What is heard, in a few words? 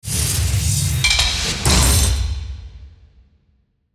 Industrial Hit Metallic Assembly Whoosh To Hit; Mechanical Button Sound Effects